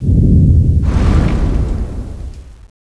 groggy loop, kraken; CSO KrakenBoss

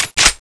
fiveseven clip in, weapons

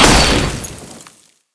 tyrantmace skill ready

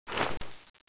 claymore draw off, weapons

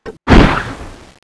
dragon sword slash, weapons

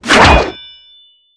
whip sword stab skill start

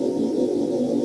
void pistol blackhole idle

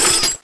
zombie stab; booster

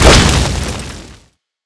sword bombard skill end

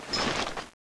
draw, weapons; mgsm

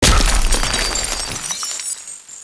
frost free; zm mod; zombies

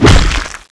axe slash hit, weapons

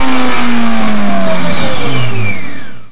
Vehicle Stop

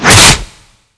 charge attack; weapons; m3 azidahaka; dragon

